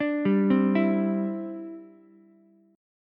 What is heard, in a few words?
D 7th Suspended 2nd Suspended 4th D, Ukulele; D7sus2sus4 arpeggiated